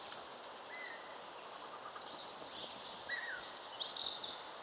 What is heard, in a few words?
Bearded Vulture